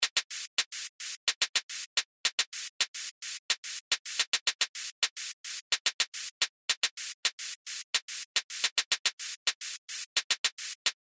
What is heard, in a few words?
gated synth